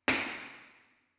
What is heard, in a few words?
IR Sweep